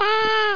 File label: Baa; Worms DC